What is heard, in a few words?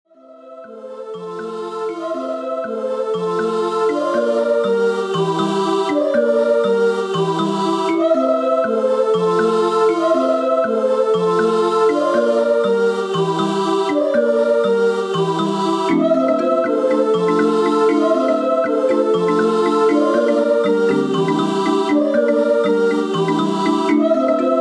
Kalimba Guitar